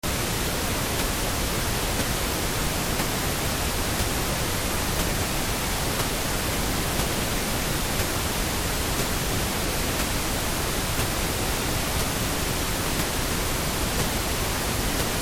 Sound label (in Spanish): impulsivo 5db menos de 10 100; Tiempo con presencia de sonidos impulsivos <10% del tiempo total; Penalización por impulsividad y tonalidad; Escuchar sin penalizar.